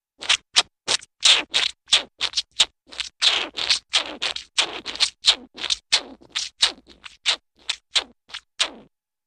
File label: Funny, Rapid Wet, Juicy, Slurpy Sounds ( I.e. Siphoning Or Plunger Effect )